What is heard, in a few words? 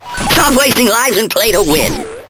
attack; Awesomenauts